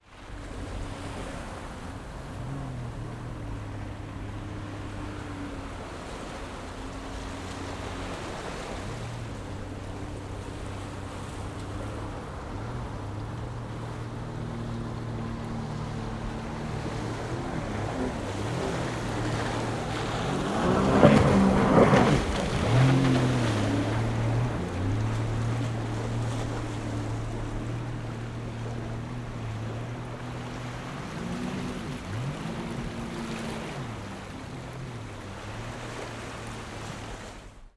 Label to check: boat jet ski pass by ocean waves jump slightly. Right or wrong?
Right